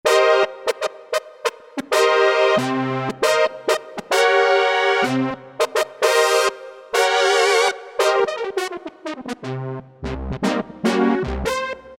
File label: Brass Analog Horns ff, Alesis Micron; Factory Programs